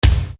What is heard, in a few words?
EFF. BD, Yamaha RY-30